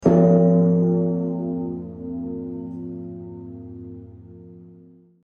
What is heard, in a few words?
death door church bell